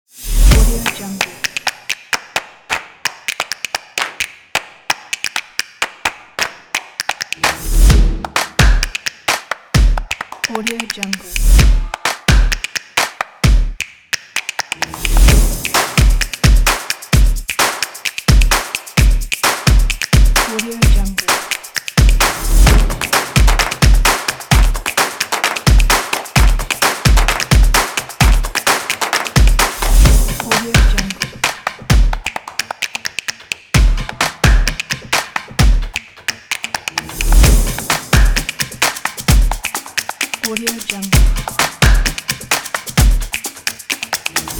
drums; harmsmusic

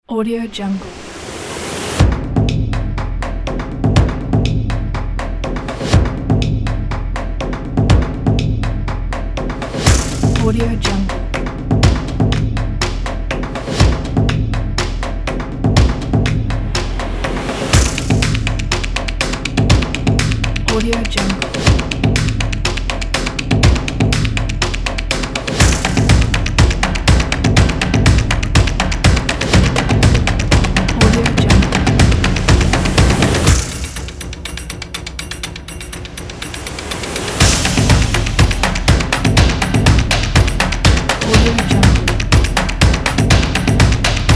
tribal drums percussion

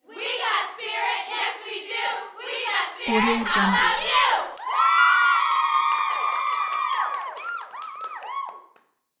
voice crowd

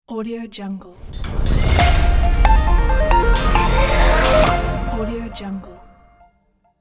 futuristic telecom industrial logo

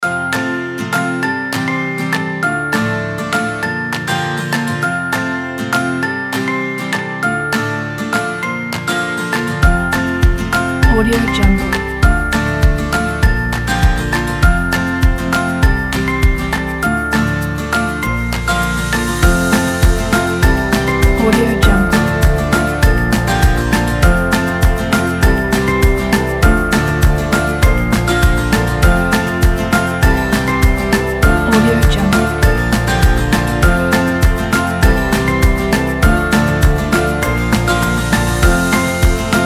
folk

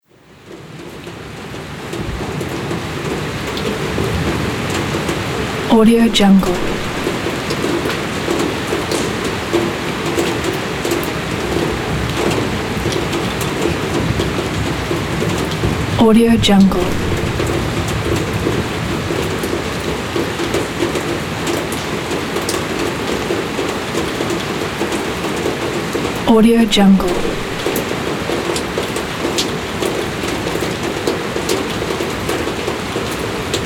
heavy rain on the roof

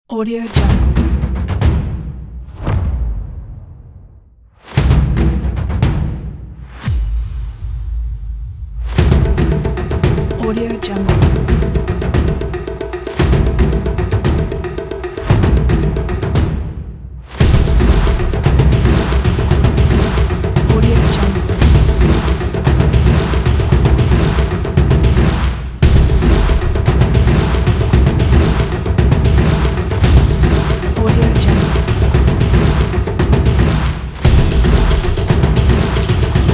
this is drum

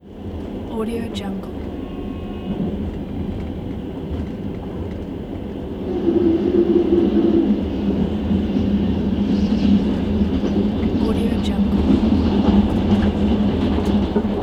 inside in carriage passing by oncoming train

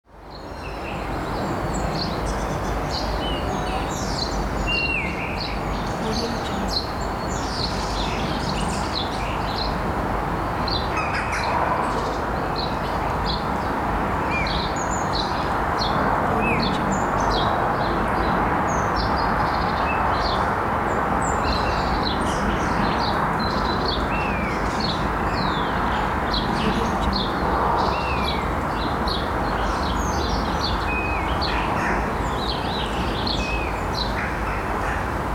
birds singing in city

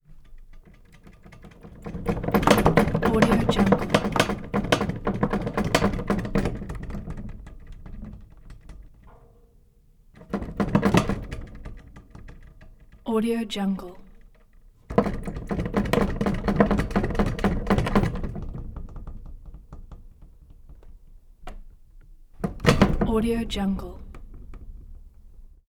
stairs shake rattle metal stairs; prosoundfx

